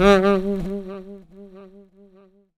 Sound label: SAX RIF; E-Lab; X Static Goldmine 2; akai; SAX RIFF1